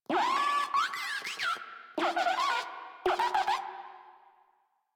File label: Moa Compassionate Vocal Player Down; Warframe; Pets